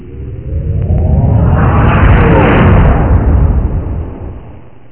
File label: Warp; solotrekii